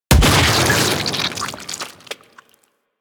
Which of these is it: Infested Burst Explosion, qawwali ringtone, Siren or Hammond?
Infested Burst Explosion